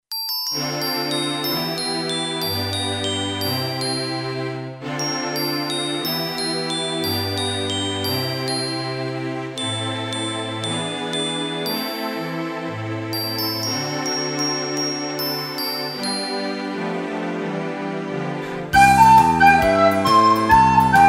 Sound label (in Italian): flauto